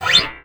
FRET, FINGER MUTE; Xsample VOL-1

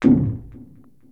Access Virus B; Synthworx

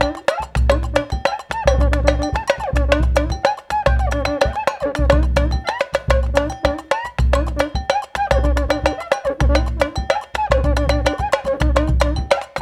RIO, Rio Batucada

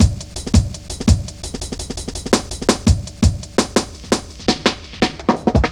Drum Loops; Zero-G Jungle Warfare 1, 2 & 3

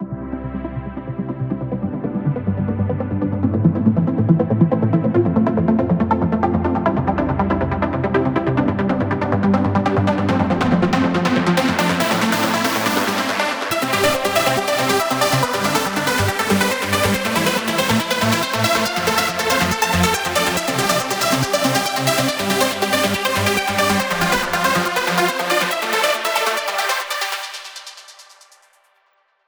140BPM C; Vengeance - Essential Clubsounds Vol.4

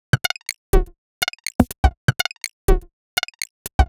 Top B 123bpm, House Drumloops; Hyve; Zero-G House Fabrik Drumloops

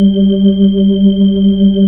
B-3 Chorus

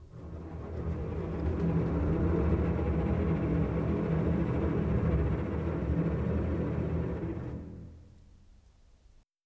Bass Cello, Orchestral; Sonic Foundry (Sony Creative Software); Orchestral VOL-2

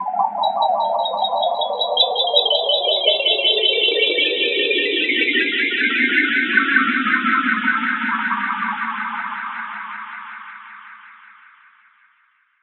Lovely Twinkles; Zero-G Ambiosis; Light

